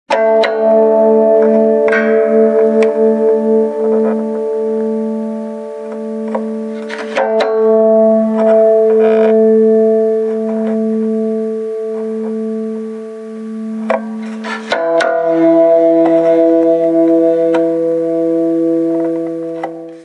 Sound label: Tune; RingTones